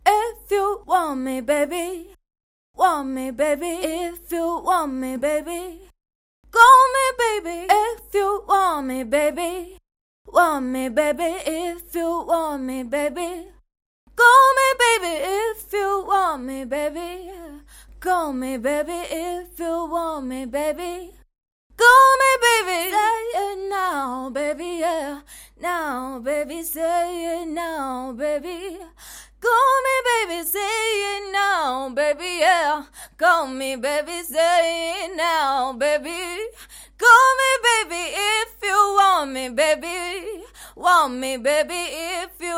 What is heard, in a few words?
pop sing female, acapella